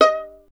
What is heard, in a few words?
STR VLN, Solo Instruments; Miroslav Vitous